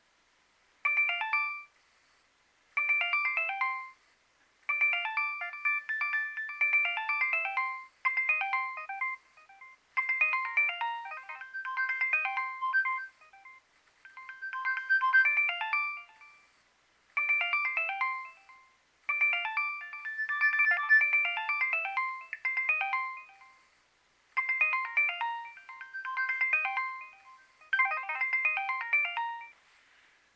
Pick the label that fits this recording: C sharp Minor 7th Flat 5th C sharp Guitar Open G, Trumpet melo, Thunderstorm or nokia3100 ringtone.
nokia3100 ringtone